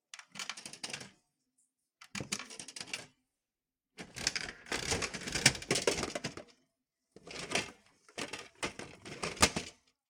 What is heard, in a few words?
vending machine